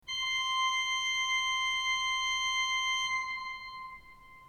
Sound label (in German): ton, orgel